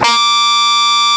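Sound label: Guitar Harmonics Gb2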